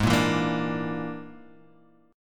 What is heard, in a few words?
Bb 7th Flat 5th Ab